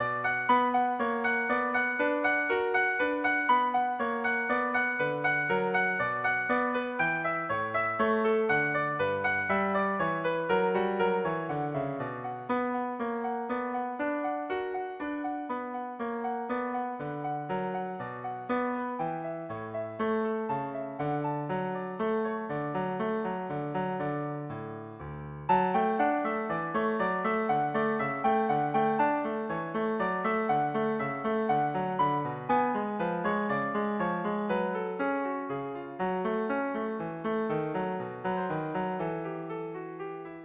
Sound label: minuet b minor, piano; bach